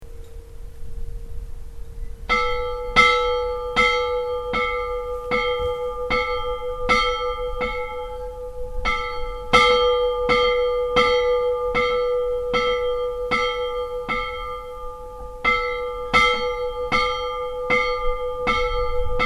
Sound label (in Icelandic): skardskirkja likhringing